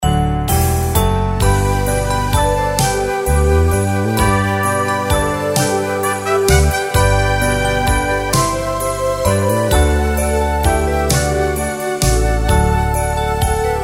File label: Christmas time zvonenie Android